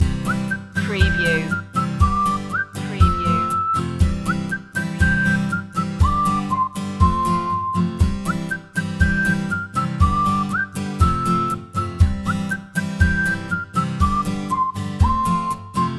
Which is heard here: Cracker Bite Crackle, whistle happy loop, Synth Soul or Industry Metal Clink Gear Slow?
whistle happy loop